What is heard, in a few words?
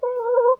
Creature